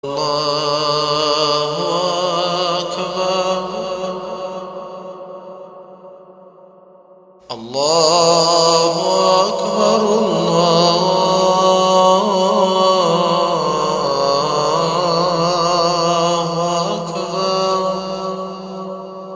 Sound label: Athaan